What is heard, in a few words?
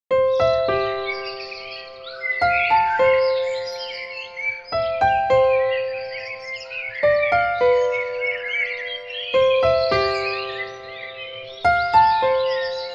calm piano melody with birds